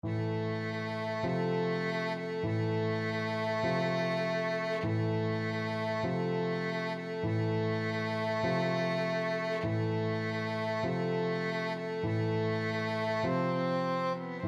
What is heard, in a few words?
funeral march, violin